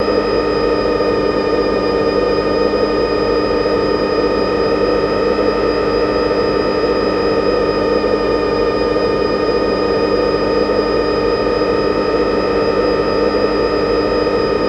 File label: Silversphere Hum Steady, Starship